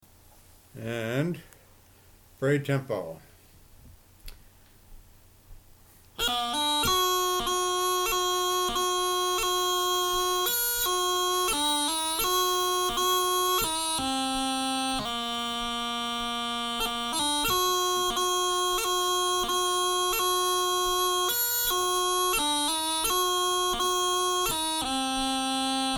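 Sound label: Marine Corps Hymn fast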